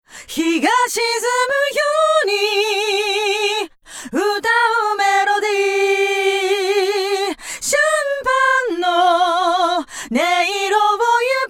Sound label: Chorus Flanger Depth High